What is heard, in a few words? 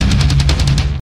Heavy Metal Guitar